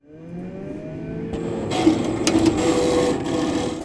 printer; AKAI S6000 CD-ROM - Volume 6; Tools

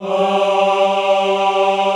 AHH G2, Classical Choir; Best Service; Extended Classical Choir

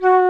FLT FLT, Flutes, Flute Duo; Club-50 - Foundations Roland; Flute Duo 1

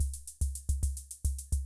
Kay R-12 Rhythmer Loop, Drum Machines

